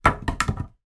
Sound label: impact wood planks x2 drop on floor together